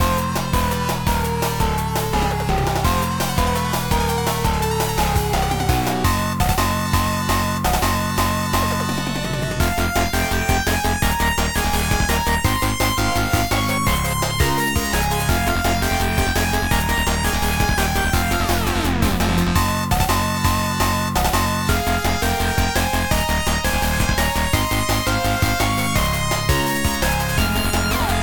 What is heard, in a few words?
Boss LOOP; Cybarian: The Time Travelling Warrior